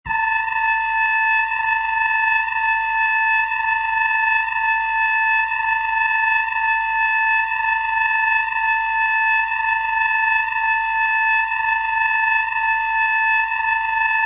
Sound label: Alien Warning, Alien warning sound; Scary Tones; Sound Effects:Horror